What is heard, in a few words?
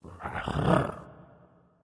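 Movement Purr; smite; god bakasura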